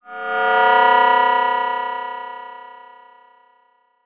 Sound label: Transition Delay Medium